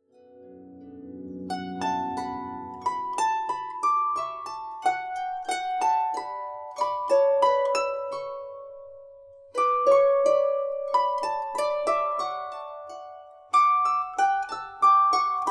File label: Waltz